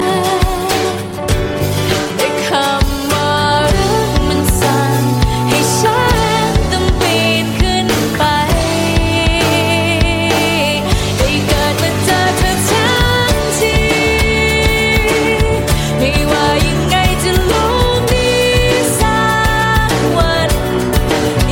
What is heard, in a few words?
Thai Song